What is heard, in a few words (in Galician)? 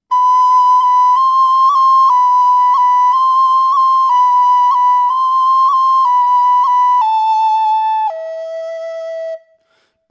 trad, Pito Galego